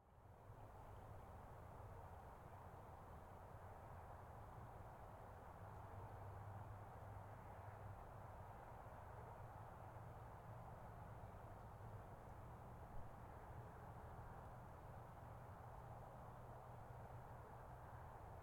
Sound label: Night Park Highway Wash; allmedia sound; Cinematic Ambiences 01 - Suburban Sonoma